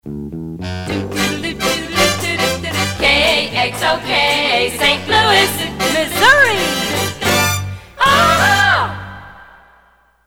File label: Uh-Uh-Huh; KXOK; PAMS